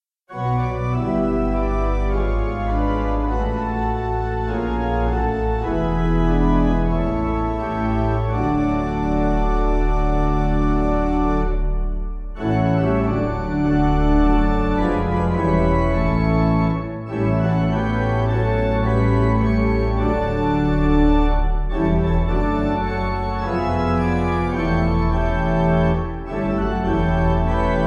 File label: Pipe